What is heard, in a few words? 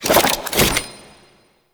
Equip Spin, Axe And Shield; Warframe; Tyl Regor